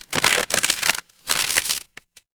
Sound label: paper fabric crumple